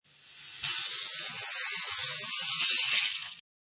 Scifi Production Element Narrow Slide; Scifi Production Element - Narrow Slide. Suitable as science fiction film and game production; Science Fiction Sound Effects; Lynne Music

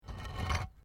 PAVING STONE MOVE, dragging

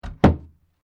Door Pivot Wooden Closet Understairs Close; Various doors; Miscellaneous Doors

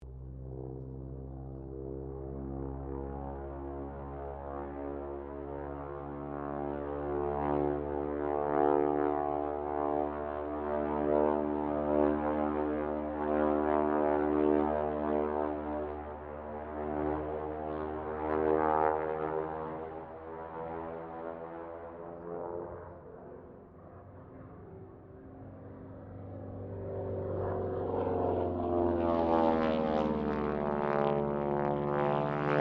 AIRCRAFT AT-6D HARVARD MK III ROLL STEREO; Temora Harvard MK III; Airplanes; Vehicles

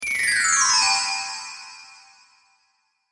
Chimes High To Low Fast With Reverb, windchime

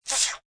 Sneeze Airy Choo, Sneeze, child, short; Sneezing

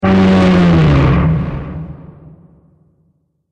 Scream Huge Monster, Monster Sound Effects; horror; Scream - Huge Monster 06

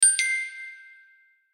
Musical Glockenspiel Bell Hits; Mallets; Blastwave

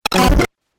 Crazy Glitch 8Bit Style; Video Game

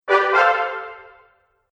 Fanfare; Musical

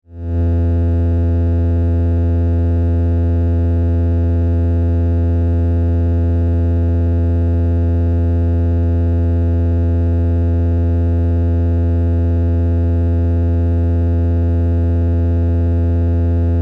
Science Fiction Low Buzz Steady Synthesized Tone; Deep Tone Sound Effects; SFX Bible 6

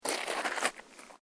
SNOW SCRAPE

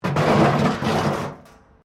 METAL BIN SCRAPE CONCRETE; Materials

